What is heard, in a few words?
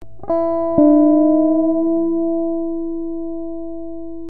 bending, 12bar